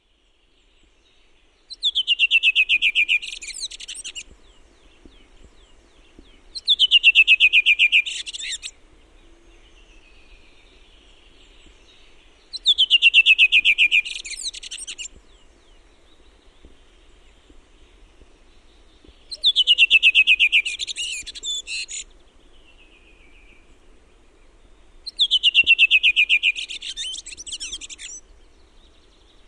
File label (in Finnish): PUNAKYLKIRASTAS; LINTUAANITYKSET